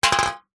food drink soda can empty set down concrete ground